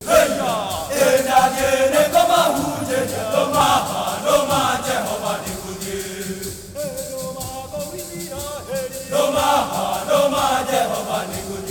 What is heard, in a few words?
Heart of Africa; Spectrasonics; Heart of Africa 2